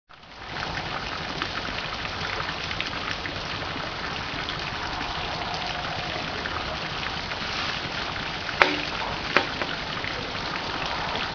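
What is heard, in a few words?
Shishi odoshi; Shishi odosci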